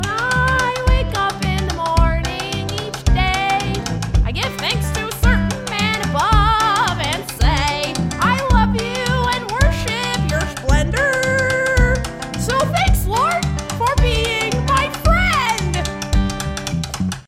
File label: Country, stem; musdb18; Music Delta